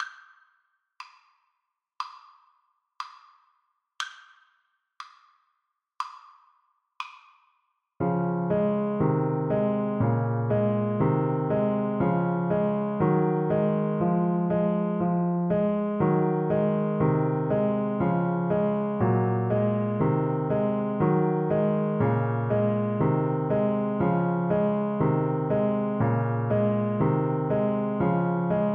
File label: Saxophone